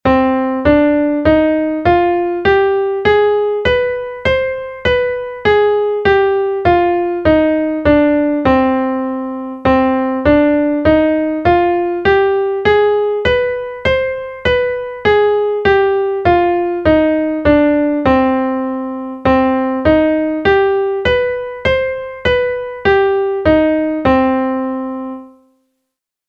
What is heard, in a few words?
harmonic minor